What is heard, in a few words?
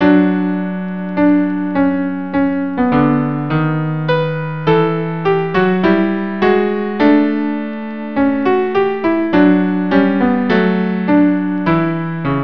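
viola cello; Pan Ang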